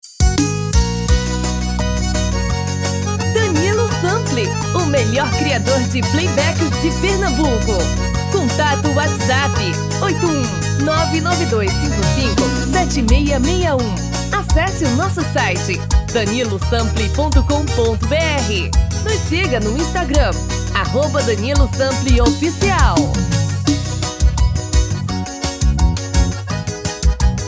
tom feminino

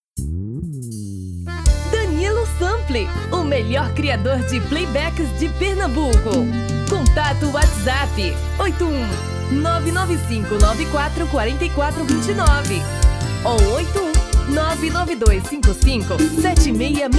Vers Forro

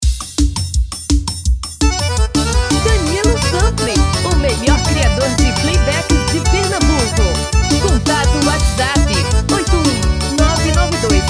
tom feminino

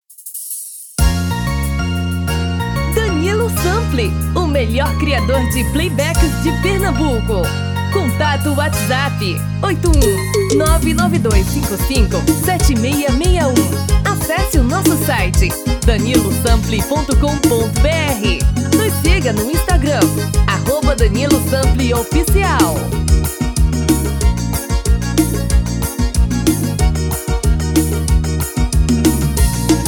Forro das Antigas

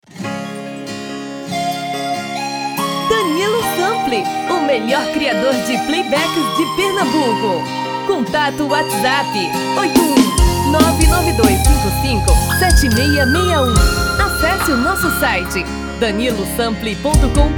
Brega

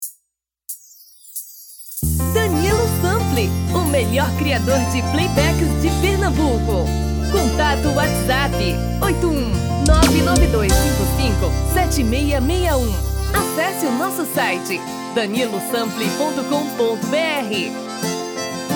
FORRO um tom abaixo

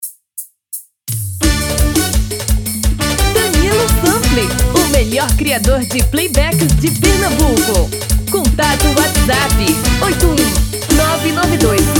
caboclinho tom feminino

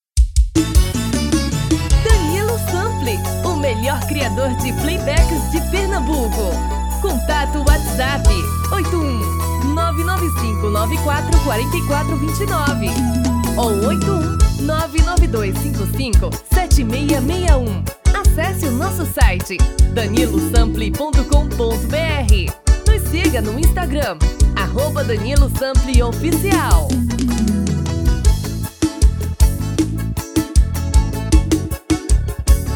Piseiro

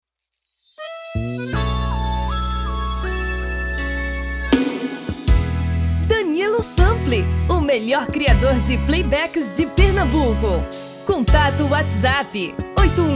Forro das antigas

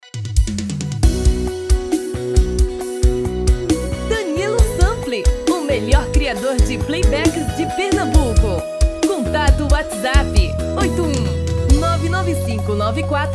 dueto

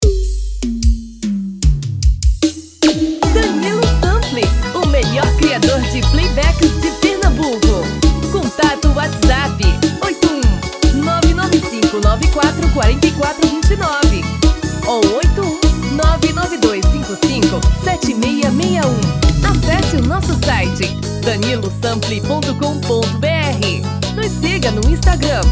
Versao Piseiro

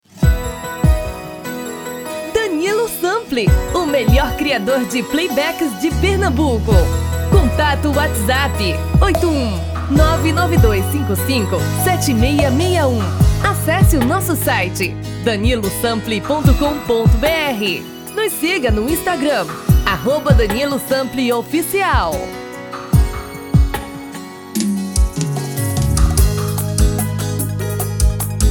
Brega

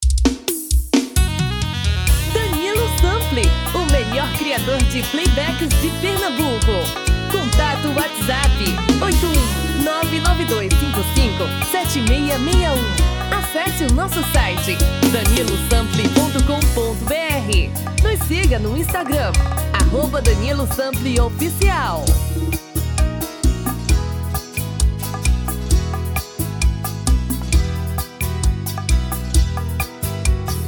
dois tom e meio abaixo

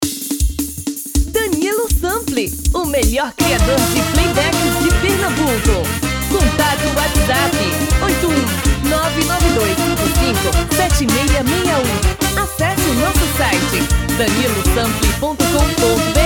FREVO; VASSOURINHA

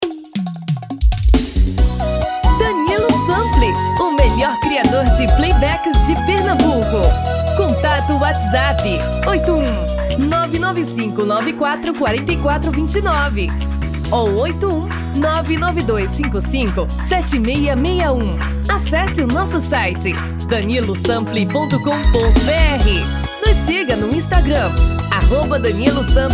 Brega

